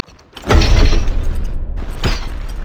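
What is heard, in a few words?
emote recall wind down; nasus base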